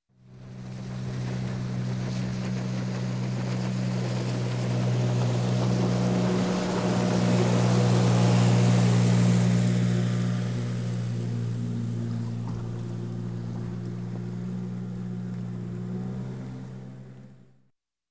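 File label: Boat sailing past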